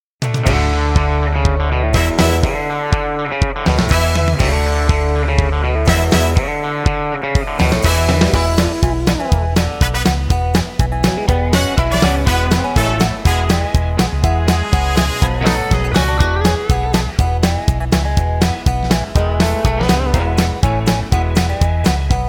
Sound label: Instrumental